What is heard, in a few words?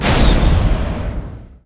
Holy Bolt; Elf